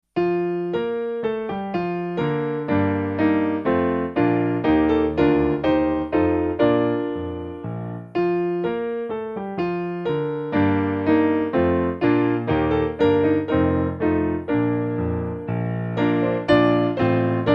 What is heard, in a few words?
Ellacombe Tune